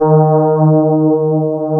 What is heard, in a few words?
CMI ORGAN